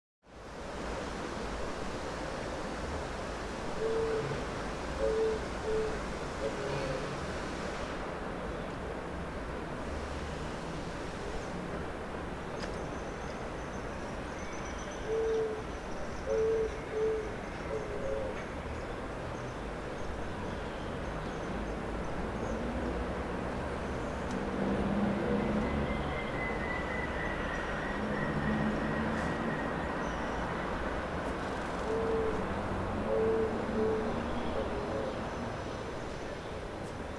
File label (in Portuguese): Praca Gaucho Casa